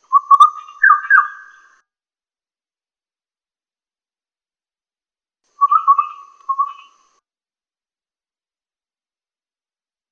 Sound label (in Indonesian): suara kicauan burung ciungair coreng kalimantan